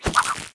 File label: vine Grab; jumanji